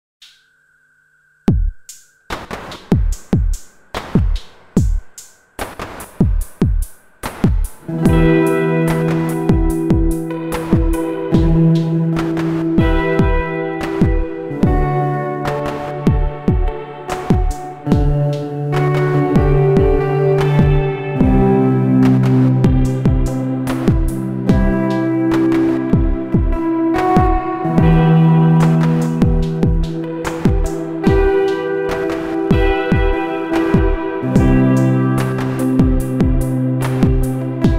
SOMA Pulsar-23 mit Gitarre Reverb

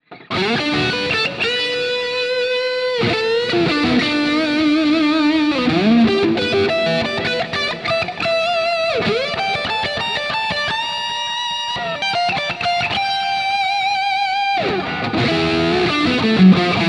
Gitarren Plug ins im Vergleich Guitar Rig 7 Highgain Lead

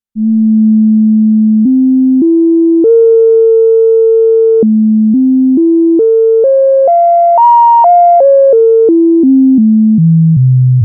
Selbstoszillation; Modul15

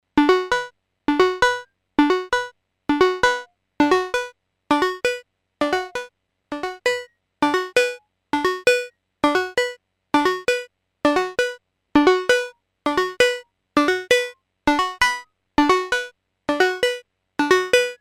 Moog Labyrinth Sequence unbearbeitet

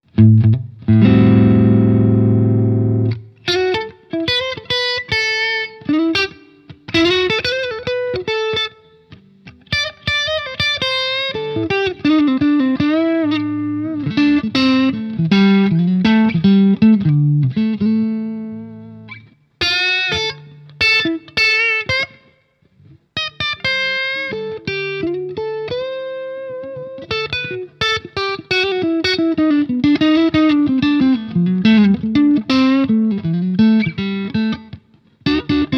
Hals bluesy crunch; Sire Larry Carlton T7

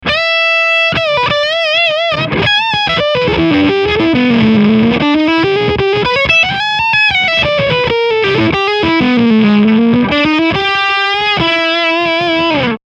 Rock Verstärker

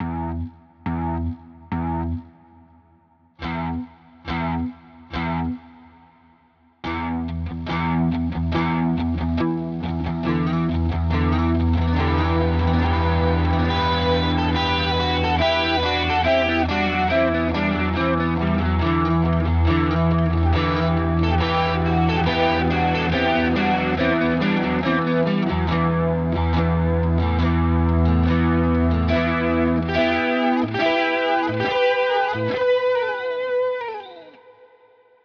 Coole Rhythmen mit Delay 70 BPM ¼ ½ 1 Wiederholung; Brighton Style

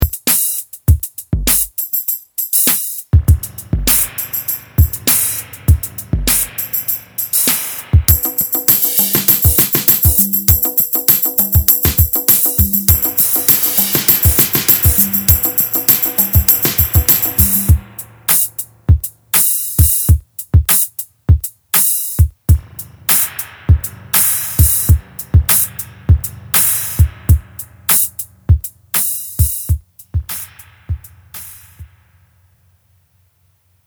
Drums mit Footswitch; Behringer 646

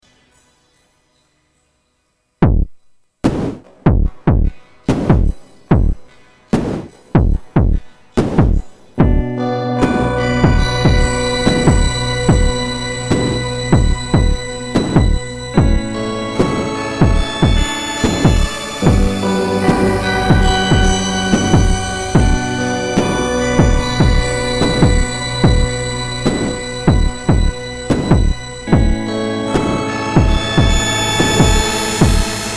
SOMA Pulsar-23 mit Gitarre Pitch Delay

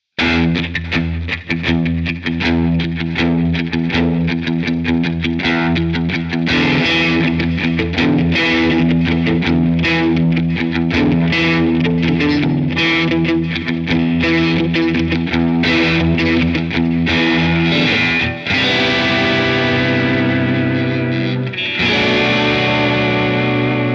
DI Aufnahme mit EQ vor Plug in

